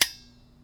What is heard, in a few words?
Spring Loaded Blade; bb - Smol Mechanisms